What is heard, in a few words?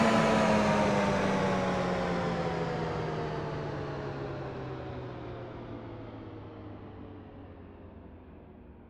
ac off, Train; OpenBVE